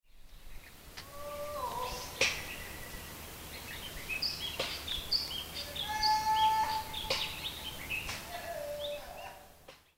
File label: sound kikori